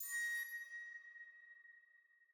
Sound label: Alchemy Flute Combo